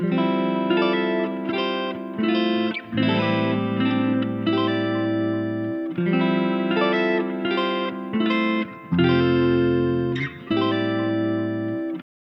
80 BPM C Maj Main Guitar; Loop Stems; Cymatics; People Change

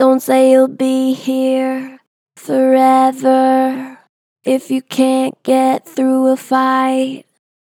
Gentle Flat, Dont say you be here, Vocals; Harmor Vocals

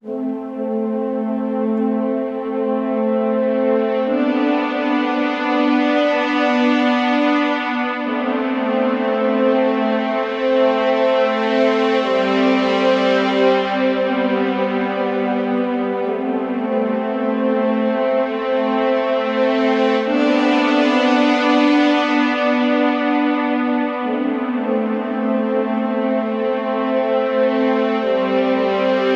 Soft Brass - Am 60Bpm; Construction Kits; Ghosthack; SkyRealm; When The Bell Tolls

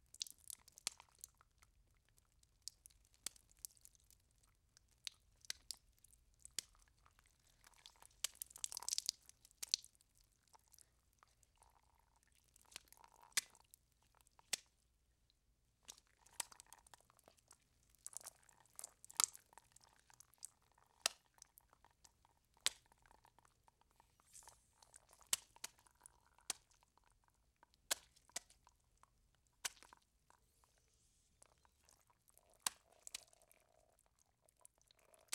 Blood Ooze Dripping; Fairlight Sound Lib

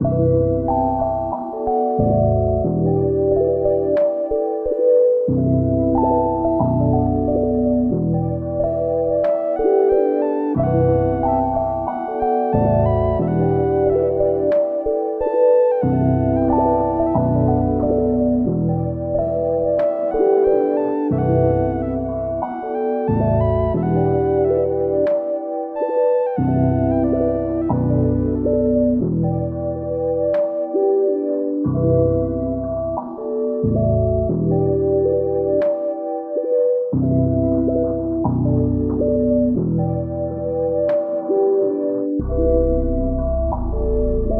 91 BPM E Min; Cymatics; Zodiac Vol 2 Beta Pack; Zodiac Vol 2 Premium Compositions

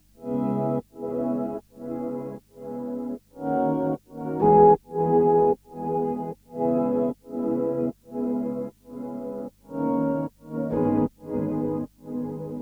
152 BPM B Maj Pad; Loop Stems; Cymatics; 2022 Melody Collection Platinum Expansion - Pt 1; Forever

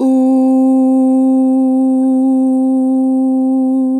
Long Ooh - 2 bar vib, Natural Vibrato, Vocals; Harmor Vocals

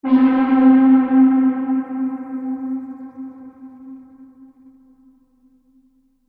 Synth Pad C, Trap; HipHop; Melody Kits; One Shot; GhostHack; Ghosthack - AC2024 - Day 5 - Melody Kits; Ghost 150 Am

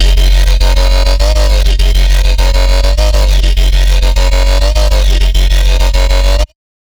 Bassline A2 140, Dubstep; GhostHack

